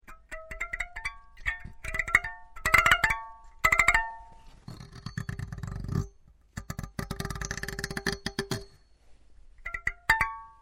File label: musicbox